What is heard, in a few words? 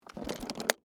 Case Set Down Small, Foley, Case, Set, Down, Small; Objects; Blast Wave